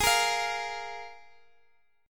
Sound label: F Minor Double Flat 5th